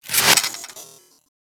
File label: Micro Jump; Warframe; Corpus; Arachnoid Micro; Abilities